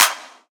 trap hand clap c key, percussion single hits one shots